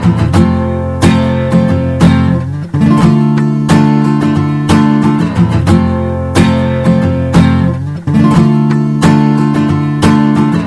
guitar; svencoop; sence; mistake coop

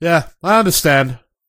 damage; svencoop; st allies; crimson commando

